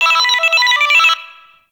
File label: Friendly Hack Jingle; Warframe; Entrati; Necramech Hack